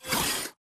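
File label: Tick Movement Servo Walk; Titanfall 2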